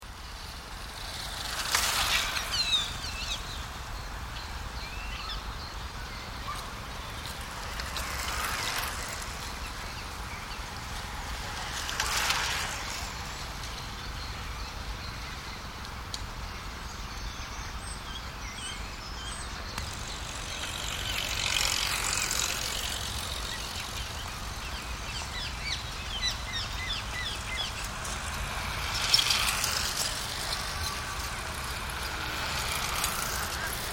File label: Cyclists passing in Hyde Park, London.; Park Cycling; Bicycles; Vehicles